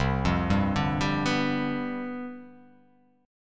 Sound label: C Major 7th Suspended 2nd Suspended 4th, chord